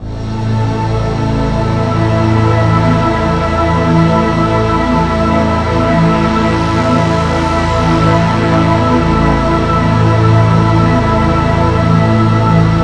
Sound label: Monster Swell, Keyboards